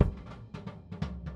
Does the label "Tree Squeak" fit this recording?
No